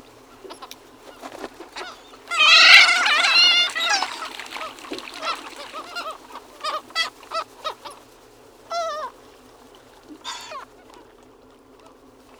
Sooty Gull Ichthyaetus hemprichii, sooty gull call